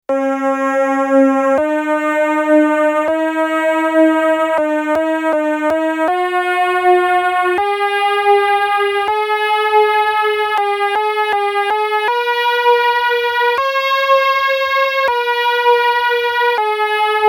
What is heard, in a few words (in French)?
gamme mineure du systeme G